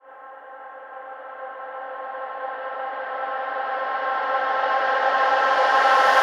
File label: Reverb Athmospheres, FX Vocals; Vengeance Samples